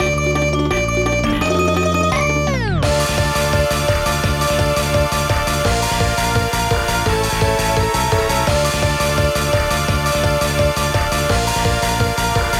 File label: Dark Forest (Instrumental); Friday Night Funkin' Mario's Madness; PC mod gamerip